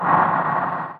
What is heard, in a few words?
roll; The Lord of the Rings The Two Towers CD Cardz Warriors; hot Spot